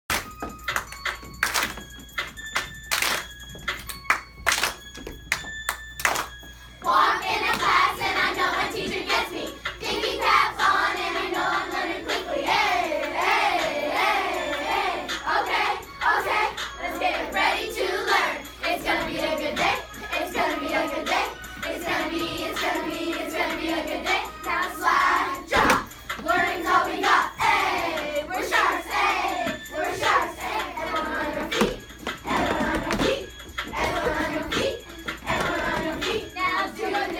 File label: morning song